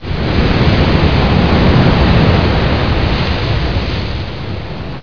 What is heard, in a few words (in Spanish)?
oceano; csde cuba